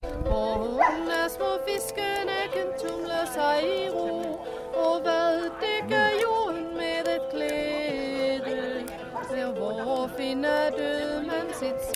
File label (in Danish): vikinge musik og sang; vikingemarked